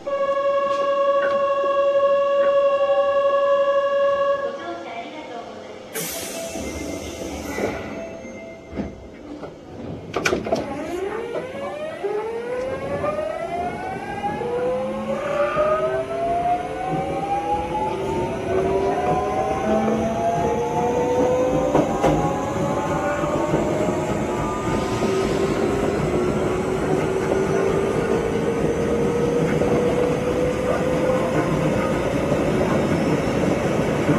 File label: vvvf mitsubishi; osaka 20